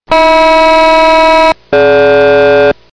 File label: Rescue Tone